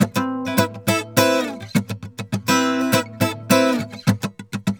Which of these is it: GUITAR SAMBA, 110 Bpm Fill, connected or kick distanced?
GUITAR SAMBA